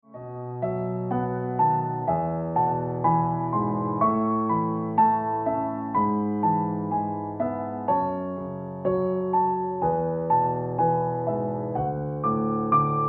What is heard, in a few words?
Ringtone Piano Music